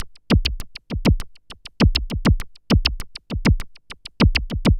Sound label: perc properplopper, Percussive Loops, 100 bpm